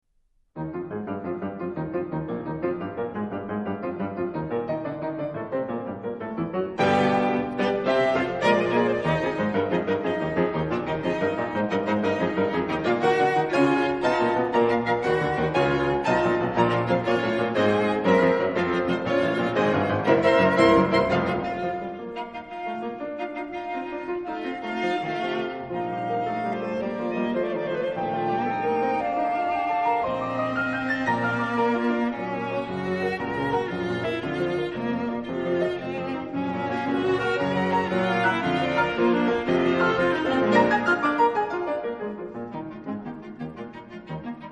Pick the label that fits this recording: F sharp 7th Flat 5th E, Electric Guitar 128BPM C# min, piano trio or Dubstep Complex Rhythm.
piano trio